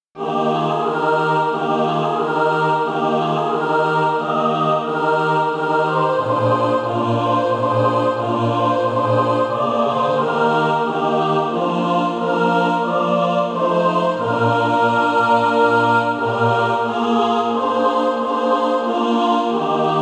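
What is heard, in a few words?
mixed, SATB Practise Music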